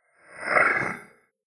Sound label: swell short haunted; sweeps-swells